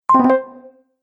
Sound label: Game Quest Wrong; Video Game Sound Effects